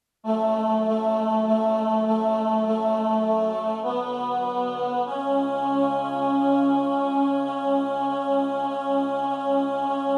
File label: Adoramus te Christe alt